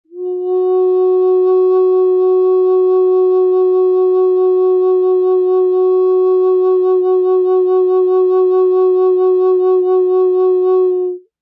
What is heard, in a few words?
Flutes Single Note, Large F Sharp